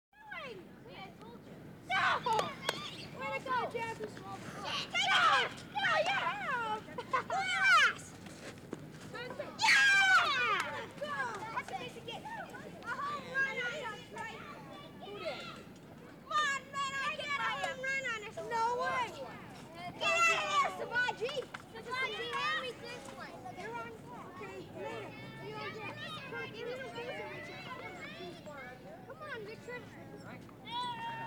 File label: children playing, Soccer Running Chainlink; Butterfinger Video Sound Effects; Soccer Running Chainlink and Huh